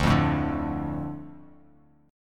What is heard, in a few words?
D Minor D, chord; Dm strummed; 10,8,7,7; JGuitar